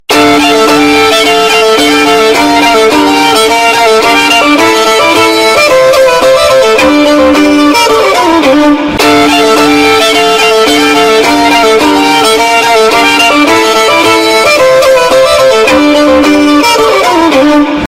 violin earrapee

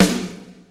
snare

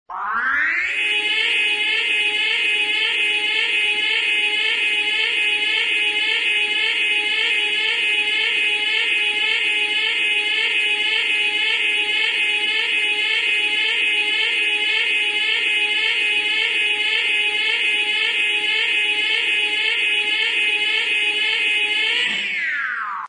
ecto 1 montage siren

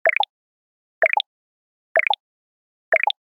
scroll page

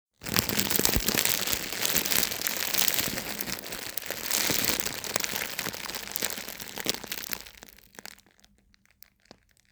falling debris

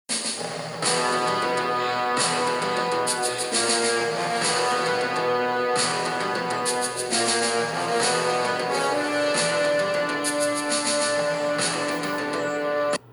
music; predator

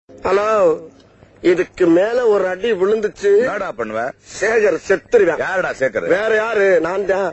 shaker

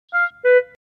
womp womp; peppa pig

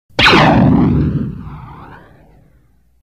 blaster; stormtrooper

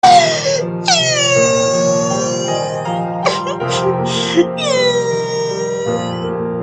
cry; underpants; toriel

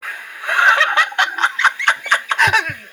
laugh

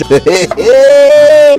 heheheeeeeeeeeeeeeeeee

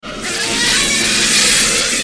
roar

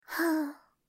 sigh

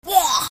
disgust

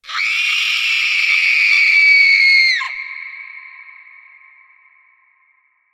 scream

